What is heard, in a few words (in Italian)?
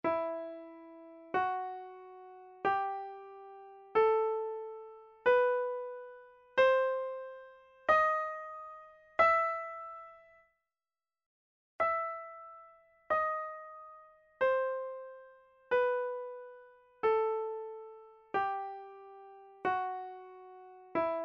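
Esercizio sulla scala di MI minore armonica, pianoforte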